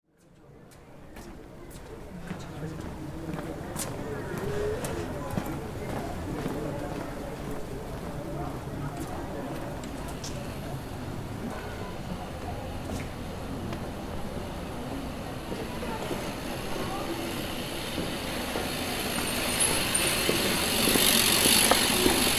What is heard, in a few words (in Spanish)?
Ambiente Anciles